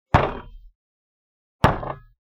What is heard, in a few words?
Hitting on wooden door